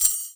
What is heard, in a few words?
SHAKER, INDIAN PERC